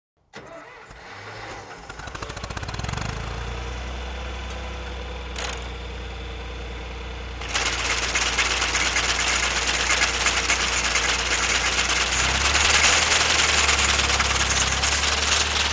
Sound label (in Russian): traktor zavoditsya vklyuchaet kosilku uezzhaet